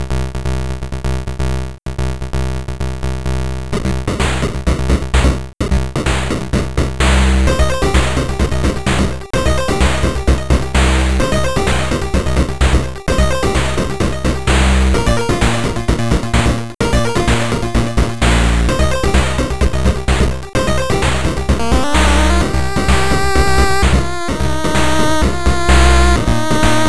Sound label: decisive chiptune theme, 8bit, Decisive retro game 8-bit loop; vigour